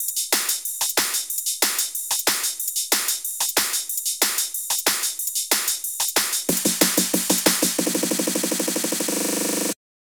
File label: Hat Clap Fill